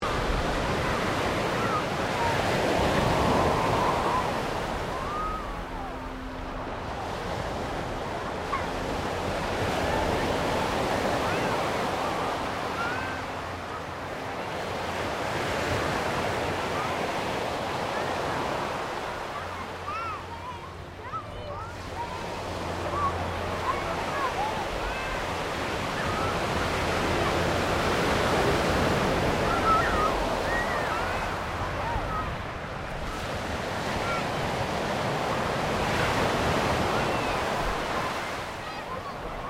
Ambience Beach Waves People Loop; Sunshine coast beach area; Outdoors

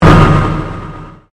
Convolution Pulse

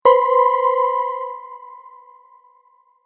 Tap Error, tapping

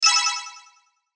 Logo Sparkle Delay; Sparkle Sound Effects; Blastwave

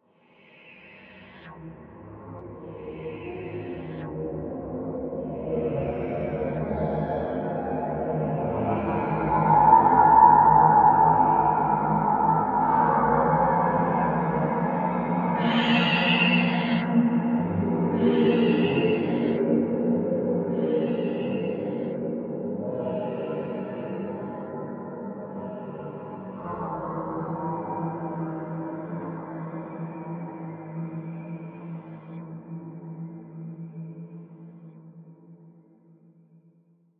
Aliens Space Terror Ambience; science fiction sound effect; Alien Sound Effects

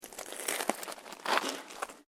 SNOW CREAK

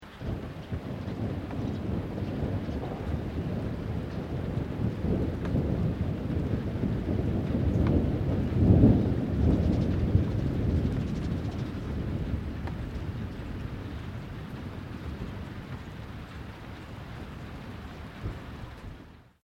THUNDER; Elemental weather effects; SoundEffects-Foundation4

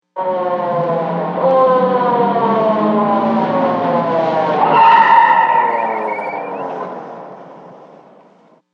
Police Siren Approach Screech; Emergency Vehicles

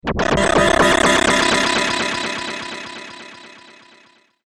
Electronic Frenzy, control panel

